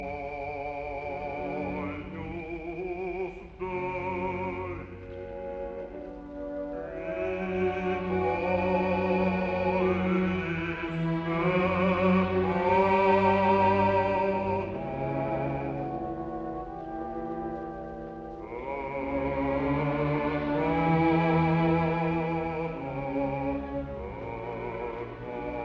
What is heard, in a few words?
Mass in D major Missa Solemnis Agnus Dei Adagio; Op 123; Bruno Walter